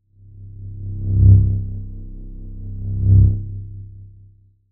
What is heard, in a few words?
Scanner Beam By, Scifi; Science, Fiction; Tech Sound Effects; SoundEffects-BlastWave